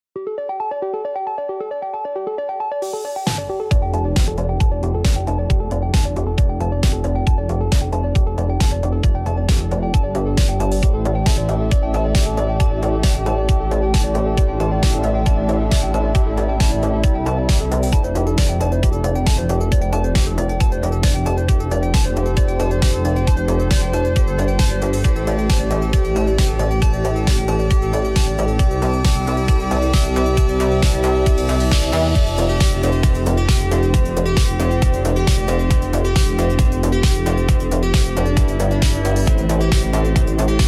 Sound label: Electronic Impulse